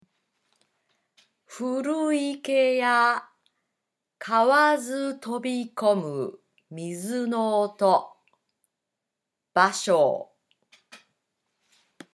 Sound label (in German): frosch